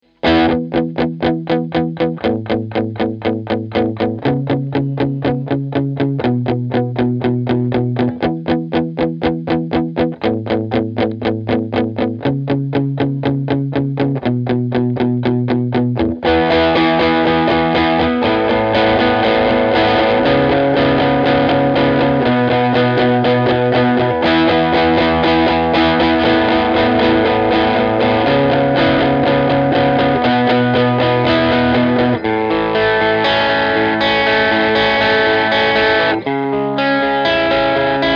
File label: tri-mode